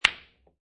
Billiard Pool Snooker Ball Hit By Other Ball Hard; Sports

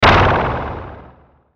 Hit Spinner Sputter; Blastwave

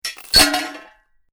Metal Junk With Chain Clanks Rattle; Metal pipe with chain attached rattles and drops

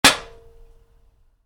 Wood hit aluminium, Wood, Light Impact: Aluminum; Materials

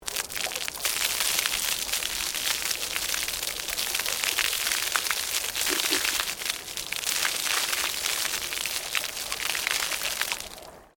Water Pour Concrete Medium; Industrial Water movement; Nature

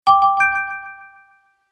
Game Pa Beeps, video game; Video Game Sound Effects

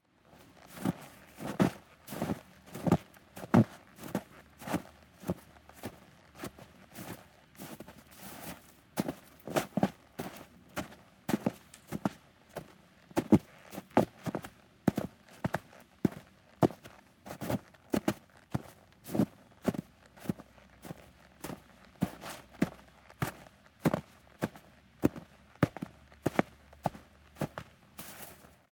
Footsteps Snow Boots; Footsteps (Sound Effects:Human)

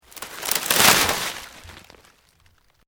Vine Dead Move, Dragging; Material components for constructing more complex sounds; Dragging (Sound Effects:Objects)

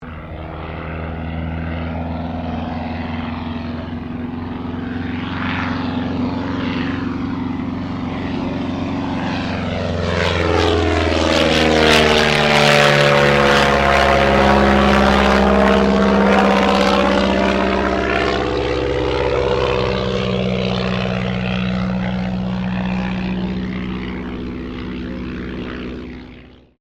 AIRCRAFT DH82A DEHAVILAND TIGERMOTH PASS; RAAF Tigermoth; Airplane Sound Effects; Vehicles

